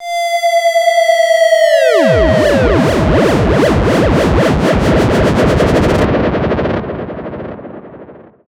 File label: fx faller